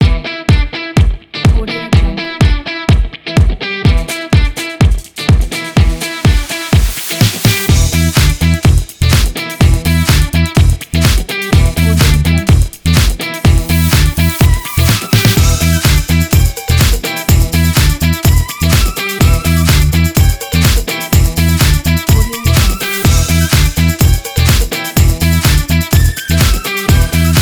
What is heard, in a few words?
disco kit; trendaudio